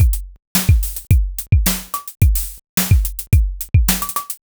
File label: k pop drum loop 108bpm